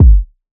kick subster; Drum Sample Pack; One Shots; Slate; SEISMIC

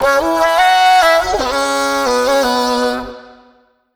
Vocal Sample Pack Phrases A; Slate Digital Omnivox Vocal Sample Pack

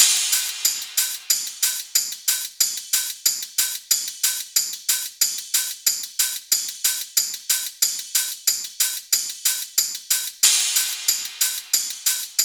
perc 92, Drum Loops; Top Loops; SD MIDNIGHT Sample Pack; Slate

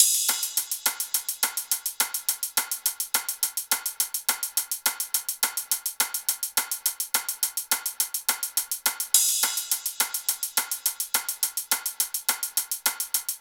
perc 105, Drum Loops; Top Loops; SD MIDNIGHT Sample Pack; Slate

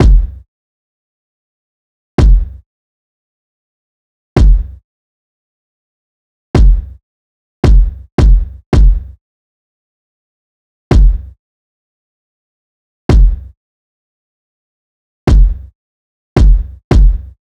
kick 110, Kick Loops; drum sample pack; Furnas; SEISMIC; Slate

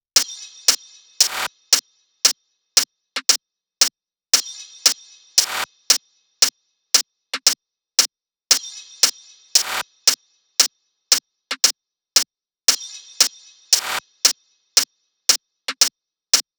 SEISMIC hats 115; Hat Loops; drum sample pack; Slate

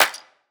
clap one shot; Slate; SD PASADENA Pop Sample Pack

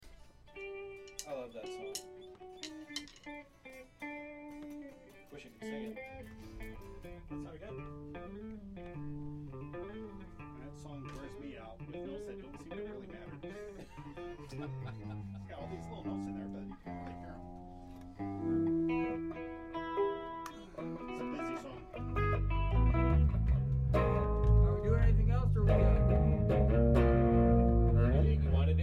Room Mic

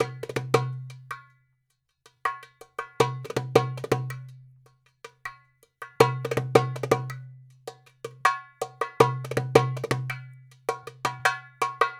darabuka loop, 80; perc construction loops; SEISMIC Drum Sample Pack; Slate

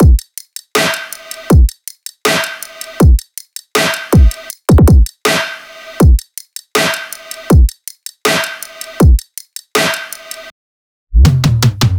drums 160, Full Drum Loops; Slate; SEISMIC Drum Sample Pack

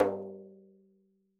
daf Single hit; Raw Perc One Shots; Slate; SD SEISMIC Drum Sample Pack

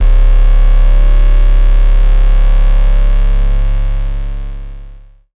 808 Bass C3; 808s; Sizzle Reel; Slate 808 Deep Dive; SD Signature 808s

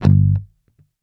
Bass Pick Short G, Electric Bass One Shots; Slate Digital BASSHOLE Sample Pack

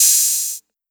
Open Hat Trappy, 909 Open Hats; Slate; Vintage Drum Machines Volume 3 909 Edition

